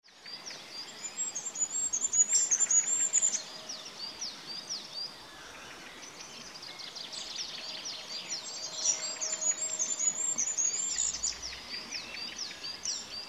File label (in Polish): mysikrólik, ptaki